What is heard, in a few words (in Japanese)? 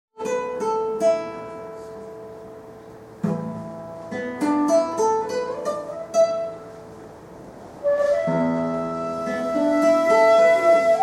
尺八; 小学校・音楽鑑賞コンサート; 「春の海」（さわり）; 尺八といえば春の海です。昨年度も聴きましたので始めの部分だけ演奏しました。; 貝塚市葛城小学校